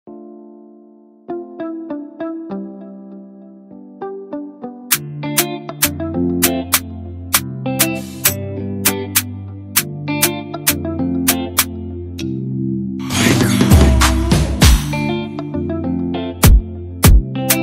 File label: INSTRUMENTAL REMAKE